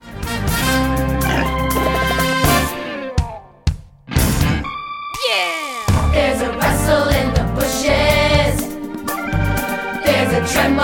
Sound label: Vocals; Wickershams; Wickersham & Jungle Citizen